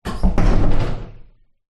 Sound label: public places public toilet shed wood door close vibrate